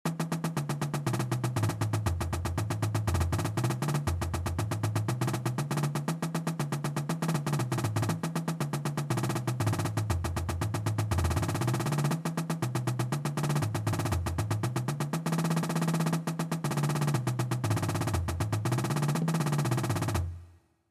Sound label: Rolls